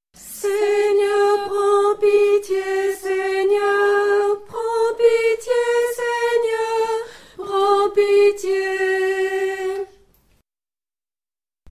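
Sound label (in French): Seigneur Prends Pitié Soprano, chœur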